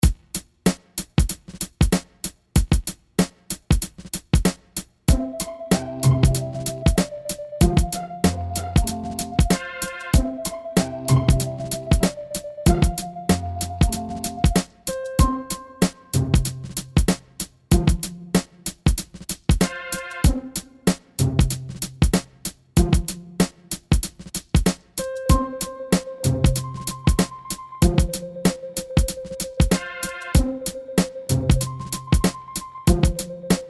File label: HipHop